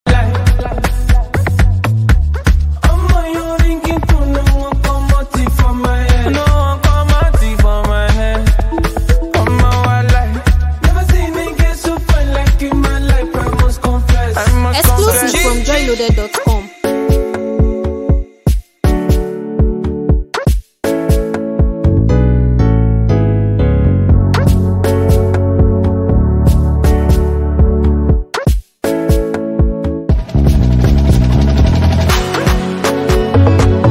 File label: Xtra Cool Instrumental Hook